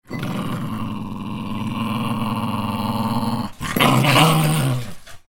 cachorro nervoso rosnando

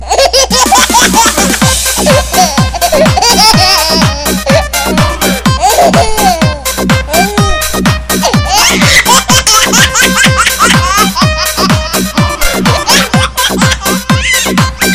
rap risadinha do bebe

